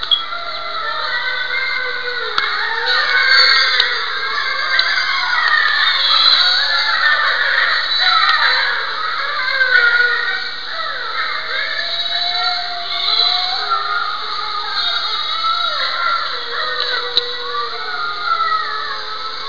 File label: wolves